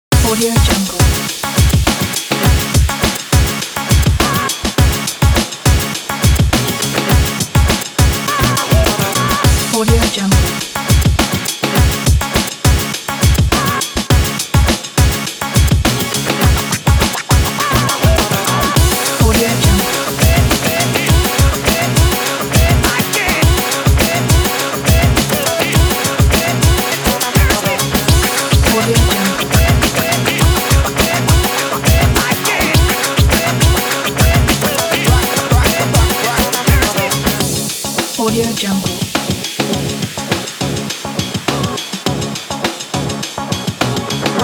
sport extreme show

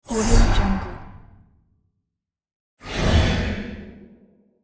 ancient game fast cast sparkle spell

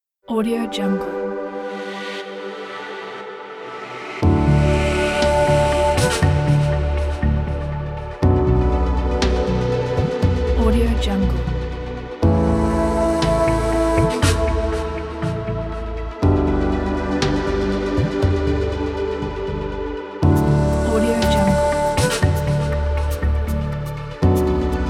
lounge chill ambient

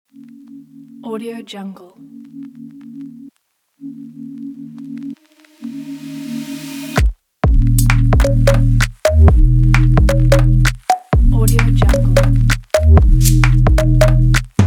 organic abstract promo

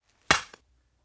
pottery breaks

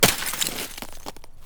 matter mayhem ice glass mono; soundmorph

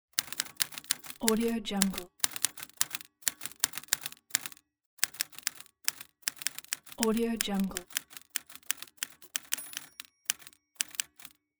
typing line on typewriter loop; fascinatedsound

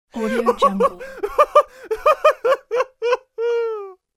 naughty male laughter

